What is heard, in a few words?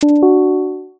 scene switch